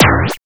Zip Boing, cartoon; Classic SFX; musicradar; cartoon caper

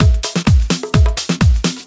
Latin Loop 128 BPM

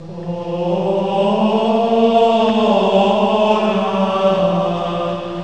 MONK; jazz jackrabbit 2; M8

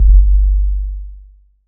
Tomm, One Shots; Sonic Foundry (Sony Creative Software) - Future Retro Dance Excursions

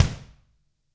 GRETSCH KICK

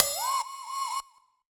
Fx Vox; Equipped Music; Loungin House

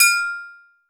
atarigane, cymbals gongs

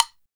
LITE W BLOK, WOODBLOCK

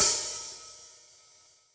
H Opn, Hi-Hat, Yamaha DTXpress

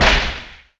fx Trash; Akai MPC5000

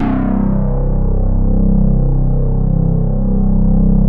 SOLID STATE, BASSES

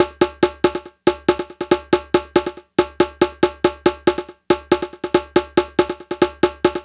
NRG Percussion, Trance, Drums; Sonic Foundry (Sony Creative Software); Trance NRG VOL-1

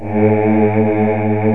D3 CHOIR; East West; Global Sounds

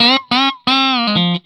RIFF 120, Vintage Blues Guitar

